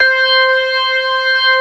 STOPS, organ combos; Keith Emerson Signature Series

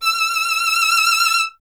STR VLN, Vln Detache; Miroslav Vitous

